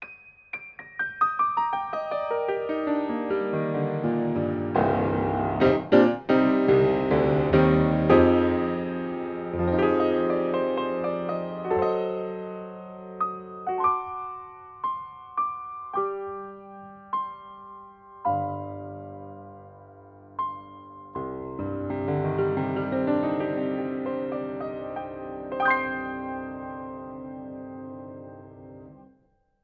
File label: bass mic